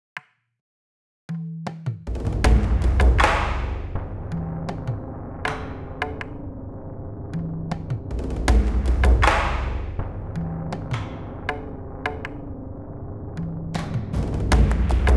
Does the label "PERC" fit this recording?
Yes